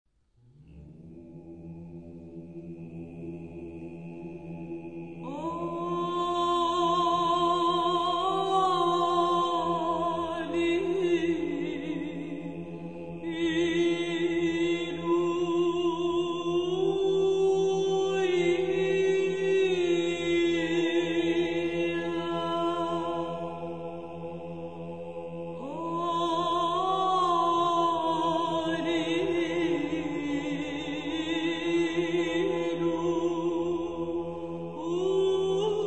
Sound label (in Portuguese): Canto Bizantino Alleluia exapostilarion